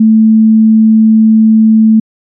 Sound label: fm sine